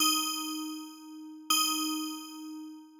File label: Pea Dance BGM Pattern